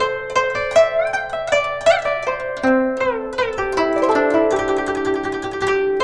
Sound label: GUCHIN 90 C; INIS - Opium